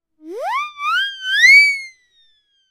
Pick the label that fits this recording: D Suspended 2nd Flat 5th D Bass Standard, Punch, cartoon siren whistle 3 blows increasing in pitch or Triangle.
cartoon siren whistle 3 blows increasing in pitch